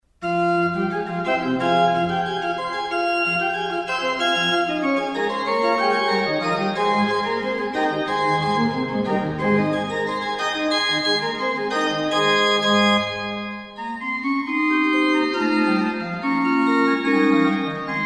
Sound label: partite diverse sopra il chorale; bach cahman